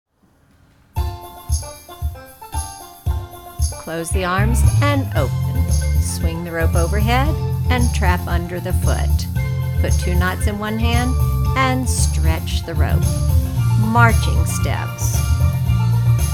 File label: LEVEL C ROPE voice